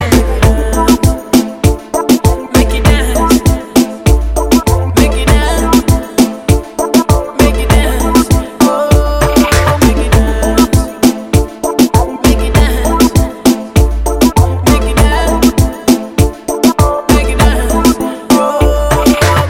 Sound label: ringtone